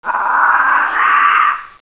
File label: jungle; Renaissance